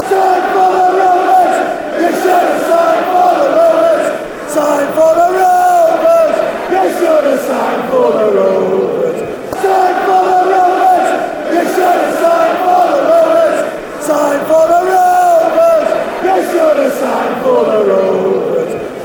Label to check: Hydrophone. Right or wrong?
Wrong